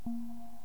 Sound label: extra pop bar, clarinet articulation